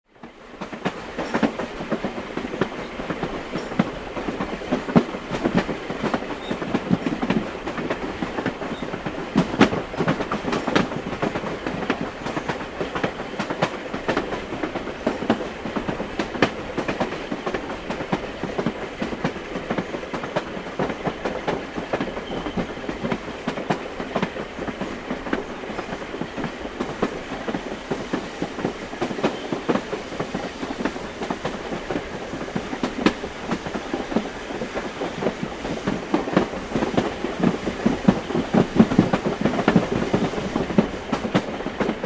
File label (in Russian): zheleznoj dorogi